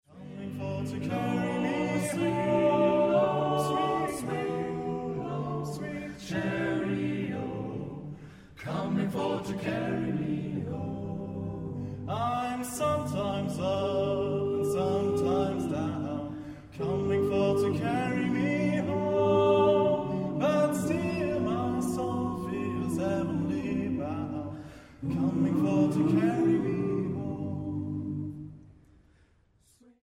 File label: Swing low; Goldene 20er